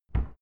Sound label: cupboard close fast subdued